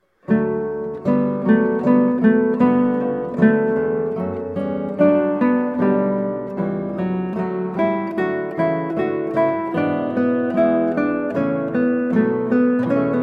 Largo from Flute Sonata in B Minor HWV 376, Guitar; Handel